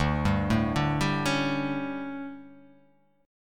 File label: D Major 7th Suspended 4th, chord; arpeggiated